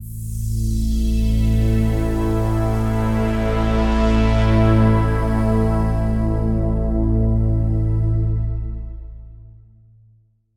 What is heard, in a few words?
Signature Sound; FXHome; Signature Tracks